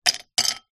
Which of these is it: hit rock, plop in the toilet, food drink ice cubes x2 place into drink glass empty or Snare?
food drink ice cubes x2 place into drink glass empty